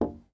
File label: glass hit; blocks; step